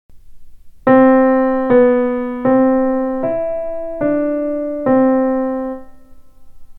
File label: pitches only